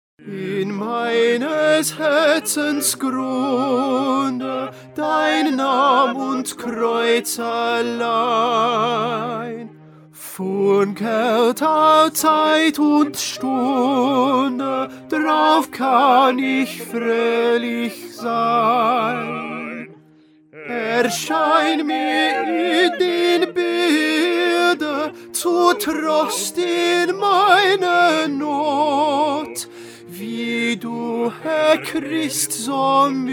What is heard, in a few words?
Tenor; Bach; St John Passion